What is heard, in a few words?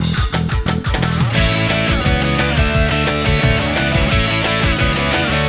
neopunk, ringtones; Road Trip; Google; NewWave Labs